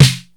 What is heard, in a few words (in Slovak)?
rytmicak pop